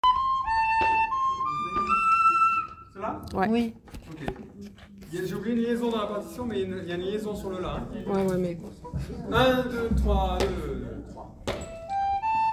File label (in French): flutes; Valse 4ème R; A5 - B5 Flutes